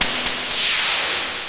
ZAP

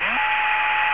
NOISE

